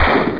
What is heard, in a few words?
B EXPLODE; PC Gamer IT CD 31